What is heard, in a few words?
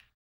HAMBONE, Vocal Blues Soul; Spectrasonics; Planet